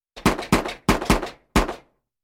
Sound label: Semi-automatic Pistol Shots